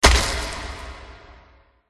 angel attack contact bottom

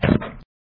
wolf attack

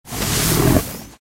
Tiger

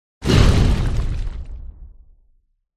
golem buff; pet; red crystallem

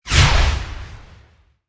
attack contact

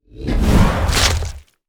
monster baf

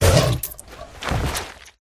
Tiger Die

